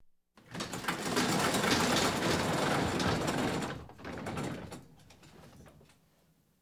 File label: Household Phones; Valentino Production Sound Effects Library CD50